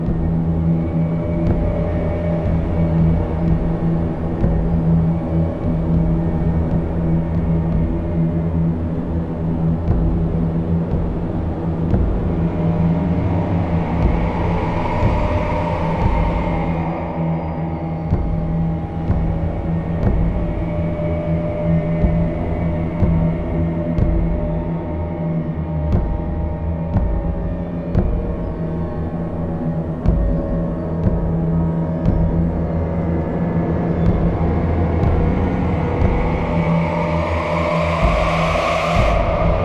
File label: Boss Intro Music; bramble the mountain king; ps4 ps5 switch windows xbox one xbox series xs; Crowandah